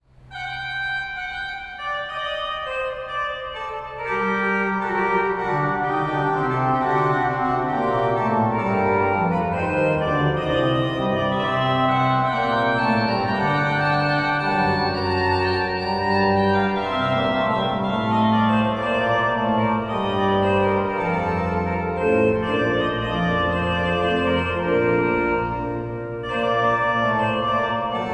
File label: cathédrale Duo Suite du deuxième ton, orgue; Sion; valais; Gilles Jullien